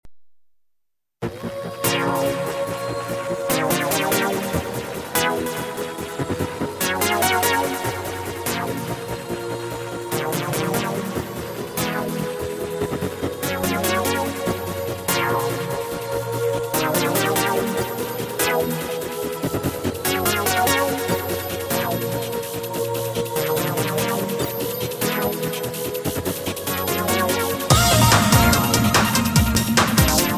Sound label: Menu; Super Mario Castle 2 Online